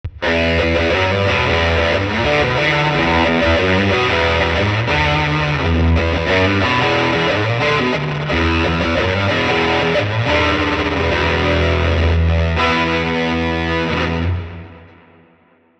Fuzz Booster Lead; Neural DSP Archetype Rabea

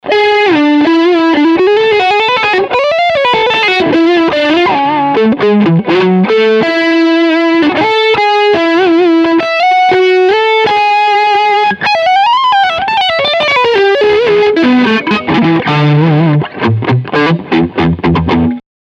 Lead Full

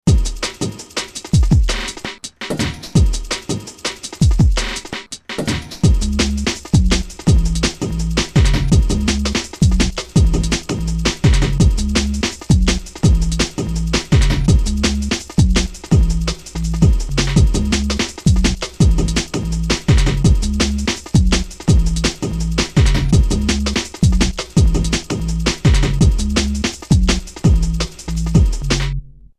DnB break

